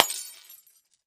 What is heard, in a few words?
Christmas Bulb Crash; housewares